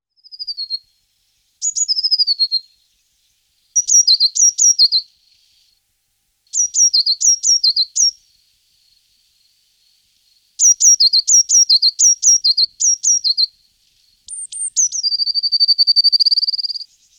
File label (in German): Blaumeise, Voegel